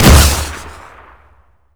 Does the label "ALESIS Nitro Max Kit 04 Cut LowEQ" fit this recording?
No